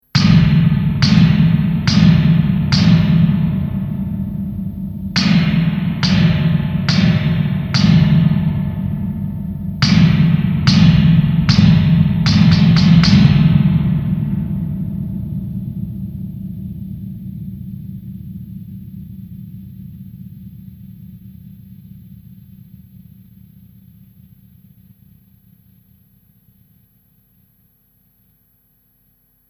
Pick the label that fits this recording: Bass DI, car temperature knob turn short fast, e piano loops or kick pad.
kick pad